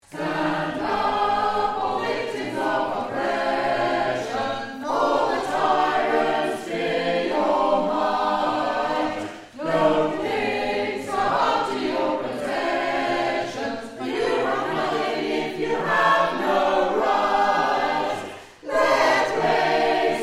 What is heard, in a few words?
internationale All